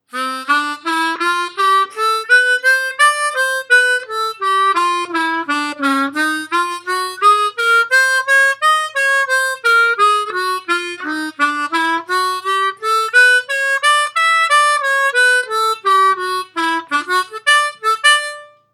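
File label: C Db D Major Scales, HARMONICA; Seydel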